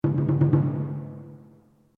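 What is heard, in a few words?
Drum Taiko mezzo forte Quintuplet Slow, Japanese Taiko Drum; Miscellaneous Musical Sound Effects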